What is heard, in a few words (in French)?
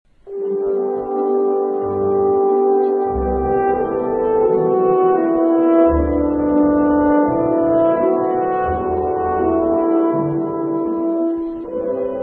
pavane mono